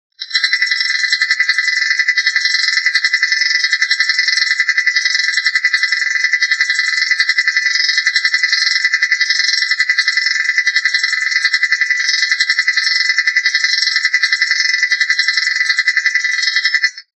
Tazer

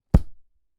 Book Impact

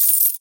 Coins Selling Sound

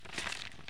Paper

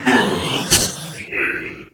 Cyborg Death

